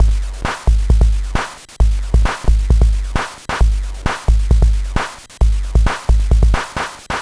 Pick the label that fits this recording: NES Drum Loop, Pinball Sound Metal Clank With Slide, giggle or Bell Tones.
NES Drum Loop